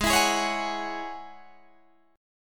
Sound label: G sharp 7th Suspended 4th Sharp 5th G sharp, Guitar DADGAD